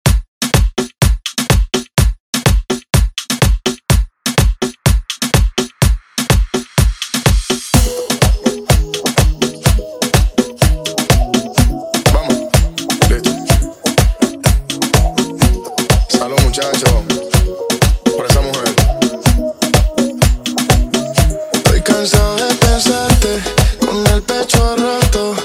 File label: Top40